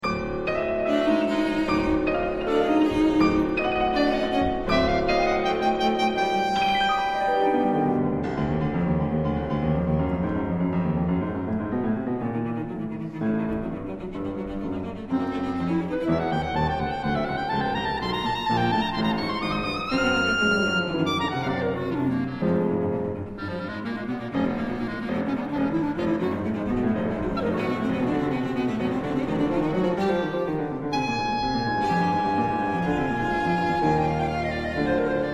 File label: Clarinet Trio